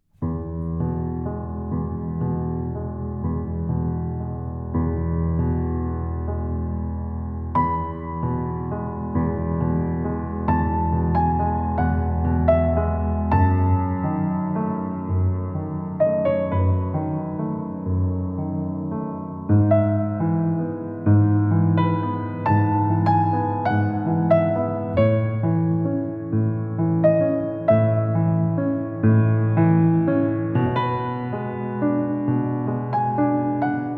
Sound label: piano